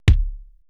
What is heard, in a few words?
PERC MIX